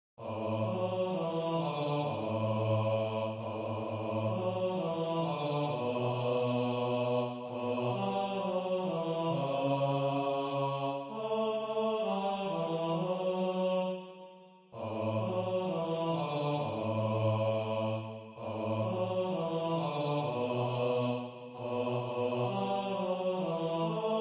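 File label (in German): solo Tiefe Stimme; Jingle Bells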